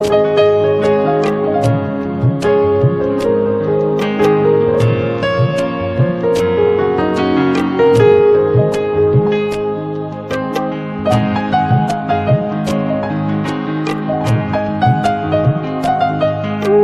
cool flute sri lanka ringtone

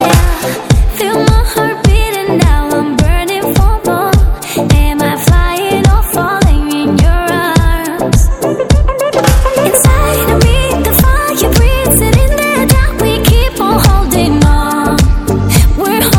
hollywood ringtone

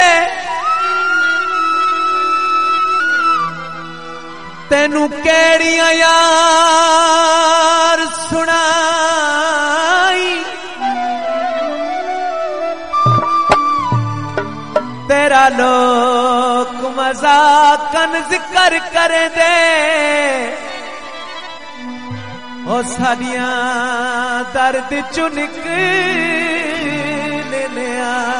saraiki sound

